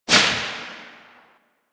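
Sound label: submachine gun Tail Indoor; Warframe; Tenno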